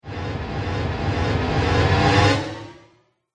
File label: Whoosh Tension Riser; sound design